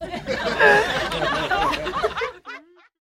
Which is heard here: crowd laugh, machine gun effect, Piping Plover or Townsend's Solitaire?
crowd laugh